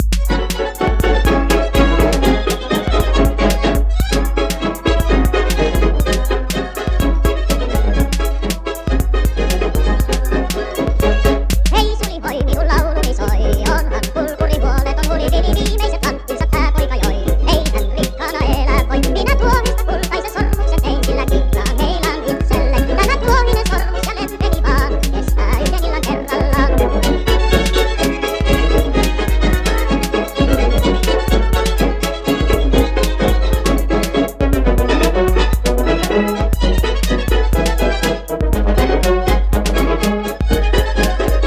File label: crazy old electro again